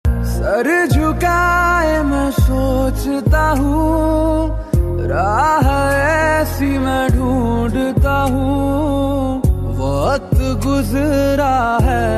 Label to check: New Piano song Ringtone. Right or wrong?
Right